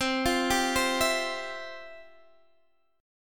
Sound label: F Major 7th Suspended 2nd, chord